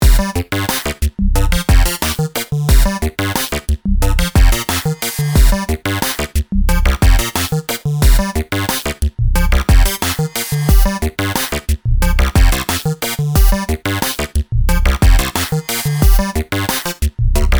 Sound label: evolve no reset drums; Numerology; Five12